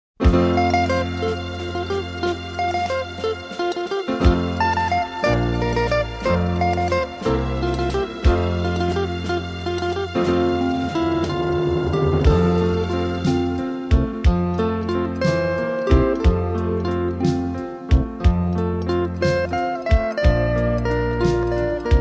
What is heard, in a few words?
zither romantic